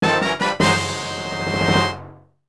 Fanfare Item

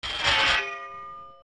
Sliding Spike Circular; zelda; OOT

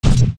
Fall Down Carpet; Link

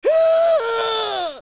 Cursed Spider Scream; zelda; OOT

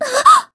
Tornado Gasp; Zelda gasping at the black tornado; Skyward Sword

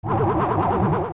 Floormaster Attack

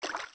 Steps Shallow Water; Zelda; OOT

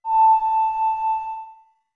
Ocarina A long; OOT

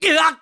Hurt Bad; Link getting hurt badly; Skyward Sword

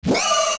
Baby Dodongo Jump; zelda; OOT

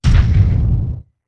Pound; Goron Link pounding the ground

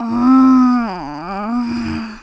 Moan; Talo moaning (trying to understand swordplay)

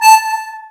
Triforce Gateway

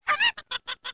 Cucco; zelda; OOT

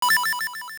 Menu Select; Making a selection on a menu; Zelda Oracle

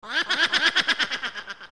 Low Laugh; Poe Sister; Zelda; OOT

